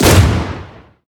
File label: bullet boom hit